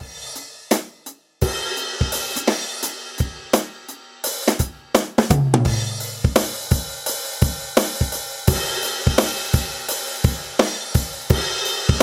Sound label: drum